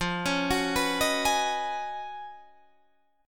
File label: Db 11th F, guitar